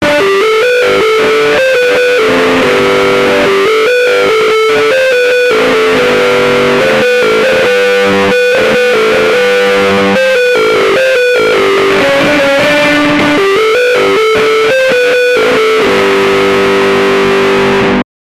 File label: the bubble 10x volume